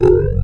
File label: rezup